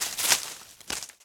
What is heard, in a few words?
Rustle